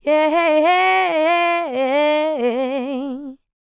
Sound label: LICKS, Vocal R&B Dance; LT DANCE